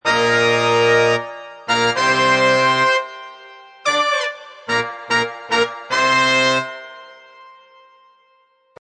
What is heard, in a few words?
Horns Full Chorus Riff A, Horn riff in A# at 110bpm.; Horns Loop Full Chorus Riff A#; Brass Loops